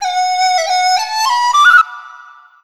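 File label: Chinese FLUTE; Soundscan vol.60; Asian Traditions; AKAI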